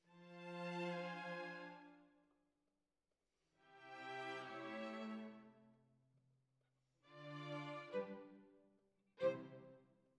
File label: Violins Over